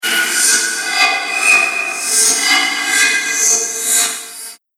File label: Multiple Slasher Strikes No Mercy; Horror Sound Effect; Hit Sound Effects